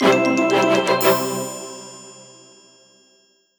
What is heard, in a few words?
win